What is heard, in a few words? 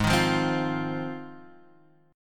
Ab 6th Ab, Guitar, Standard; strummed; JGuitar